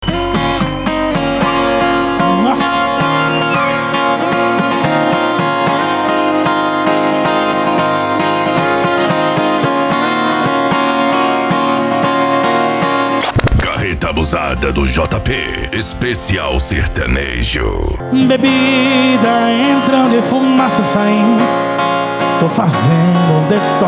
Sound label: sertanejo